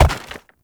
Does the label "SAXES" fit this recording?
No